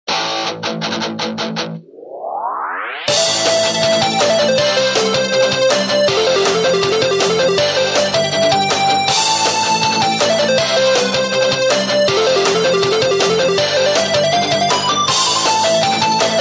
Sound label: high speed